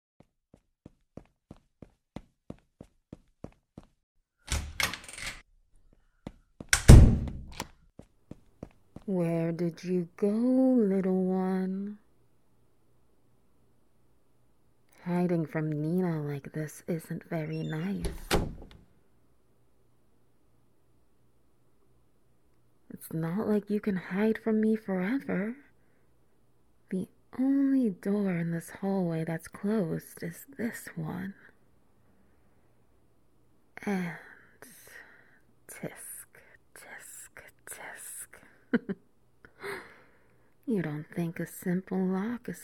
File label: SFX Wet Noises